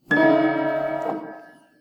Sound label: piano